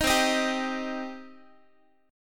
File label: C Minor C down Guitar; strummed; JGuitar